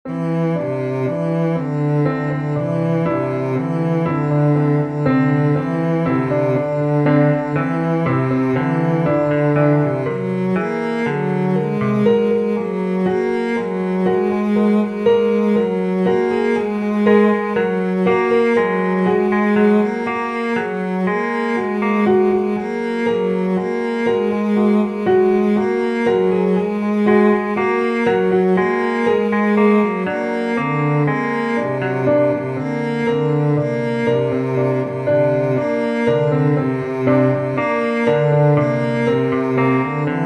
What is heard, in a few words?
Mixed melody